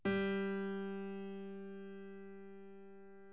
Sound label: piano G3